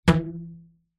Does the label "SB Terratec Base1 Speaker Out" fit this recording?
No